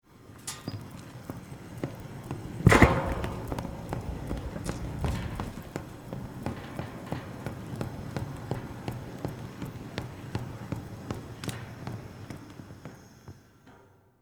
Staircase Roll, Industry, Staircase, Roll, Across, Wood, Floor, Large, Metal, Staircase; Factory; BlastWave